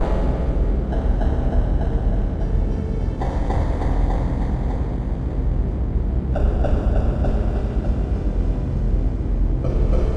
Dungeoneering Floor Track

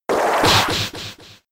Power Whip; Pokemon; Gen 5; Blk Wht Blk2 Wht2

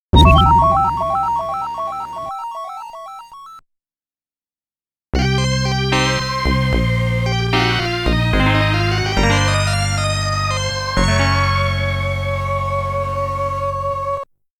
Miss ~ Game Over; fantasy zone

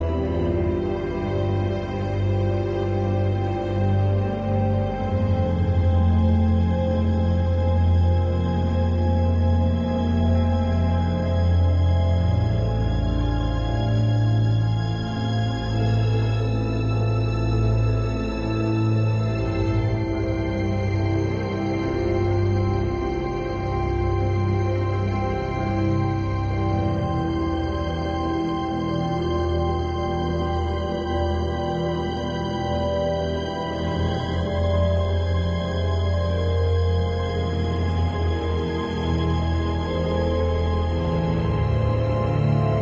loop Suspense; The Walking Dead Season Two

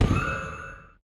Gems Transform; Puzzle Chronicles; PS3; PSP; X360

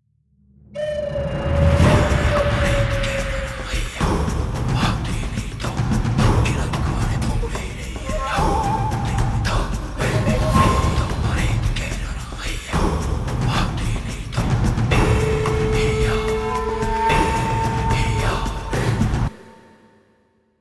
Monster Hunting Combat; Skellige

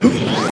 Mascot windup

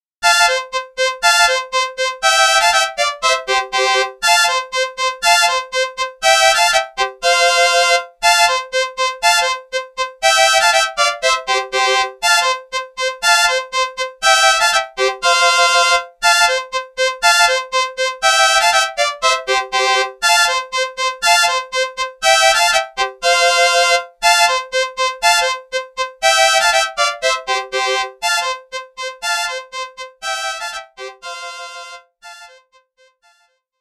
Gramophone

